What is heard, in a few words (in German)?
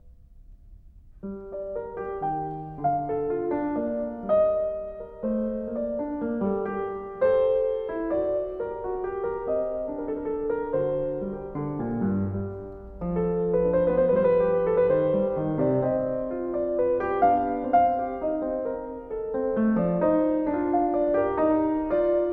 Zweistimmige Inventionen g-Moll, klavier; Zweistimmige Inventionen XI) g-Moll; BWV 797; BACH; Inventions